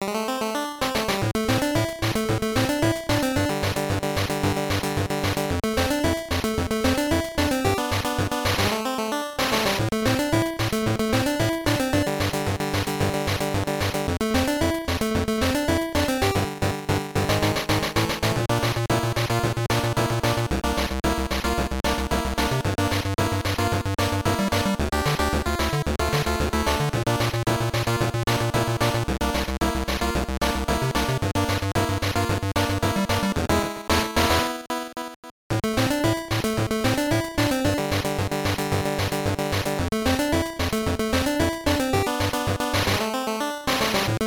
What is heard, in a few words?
Boss Rat; Battletoads